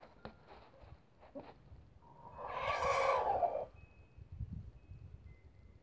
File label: predator warning; whitey and mohawk